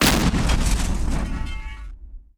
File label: dispenser explode